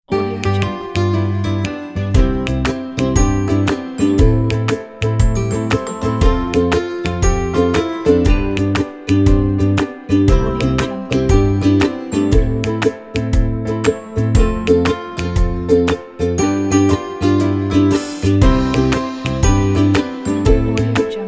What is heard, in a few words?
be happy ukulele